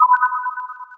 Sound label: Earjack insert; Samsung SGH-i300